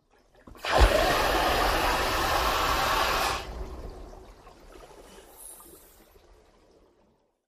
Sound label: Big Air Release Bubbl; bubbles